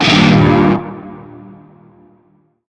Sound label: Shawzin Blues Chord B; Music; Warframe; Narmer Shawzin